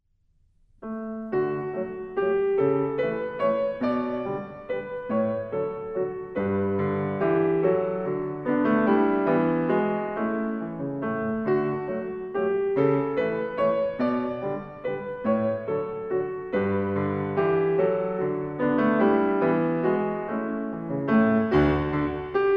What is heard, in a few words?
Baroque Waltz